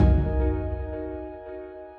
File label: transition to loop, Cinematic Battle Music; Midnight Dreams